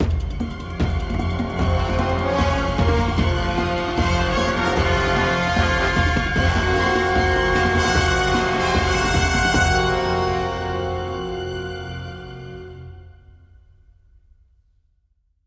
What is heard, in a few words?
Chase Stinger; Cartagena